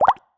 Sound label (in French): bulle; transformice